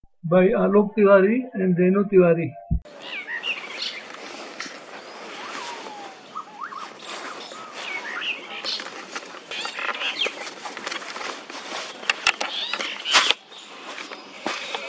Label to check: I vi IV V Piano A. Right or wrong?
Wrong